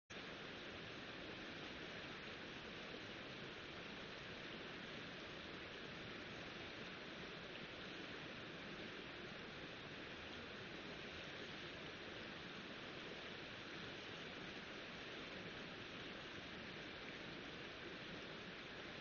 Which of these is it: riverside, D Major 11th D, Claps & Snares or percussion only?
riverside